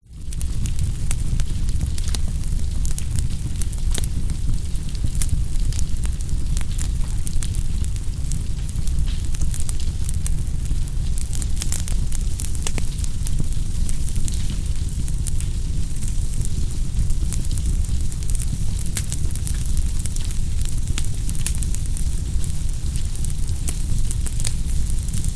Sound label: nature fire big